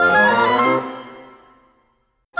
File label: Winds, Orchestral; Sonic Foundry (Sony Creative Software); Orchestral VOL-2; Modern 18